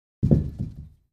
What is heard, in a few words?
Free Weights Exerci